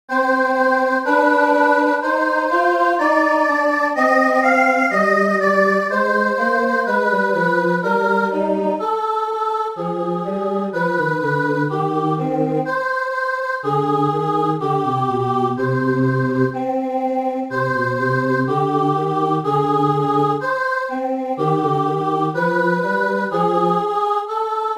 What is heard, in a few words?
choir; Palindrom (oda-vissza) dallamok